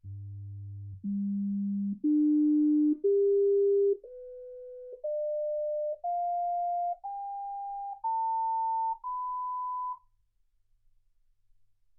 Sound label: sinus tones 48kHz